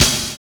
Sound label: DOUBLE BD; Univers Sons